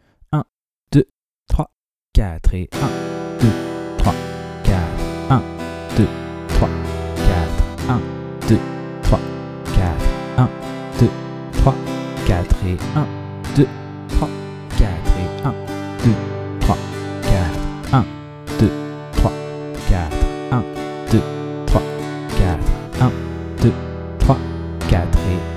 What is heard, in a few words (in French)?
2 moyen La rythmique rapide avec la dernière grille; Travailler la rythmique rapide avec la grille d’accords populaire : Em – C – G – D